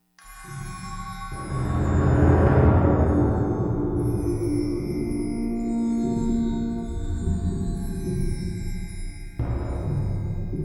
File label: Theremin